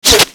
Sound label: Bullet Default Hit